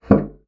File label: bassoon slap; Xsample VOL-6; C BASSOON